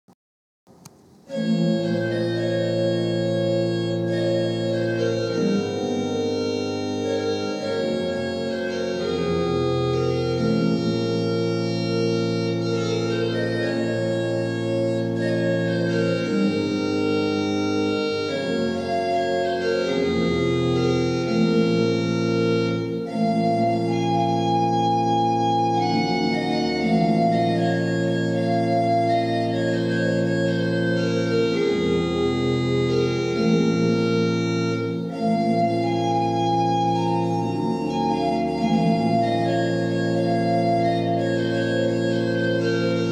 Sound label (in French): Morceau d'orgue